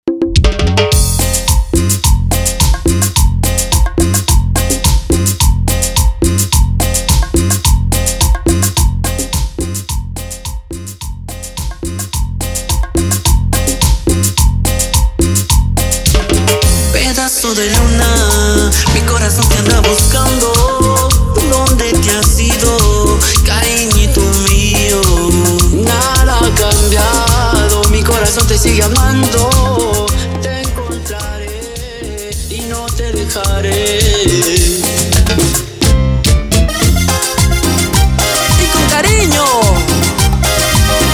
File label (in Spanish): Intro Melody, 107 Bpm